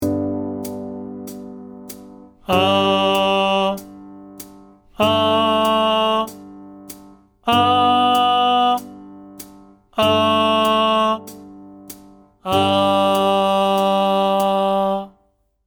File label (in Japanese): 男 息漏れのない地声